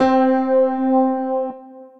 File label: Grand Piano Classic Stack; Ableton; Factory Packs; Grand Piano Hybrid